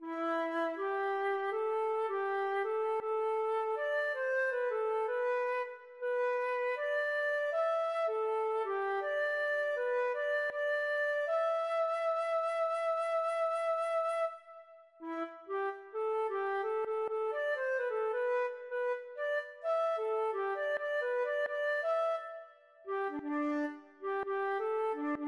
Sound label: nge, feadóg